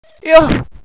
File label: hostage pain; cstrike